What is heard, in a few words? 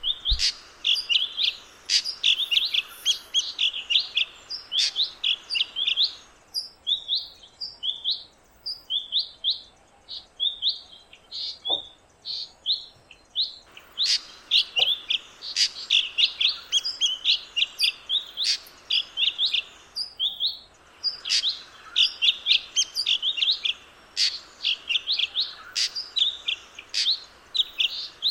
Ashy drongo; بوچانگای خاکستری